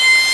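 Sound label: call S1 three in row syl1, Sea Sounds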